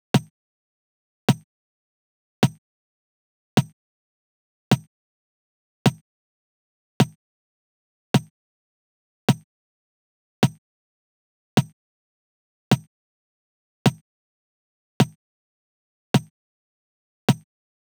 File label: Drum Loop, 105 BPM Percussion, Latin; Loop Stems; Cymatics; GHOST (Beta Pack); Crisp Drum Loop